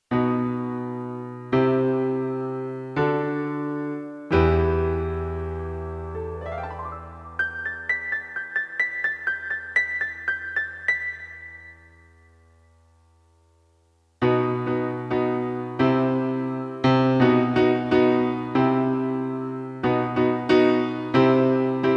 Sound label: piano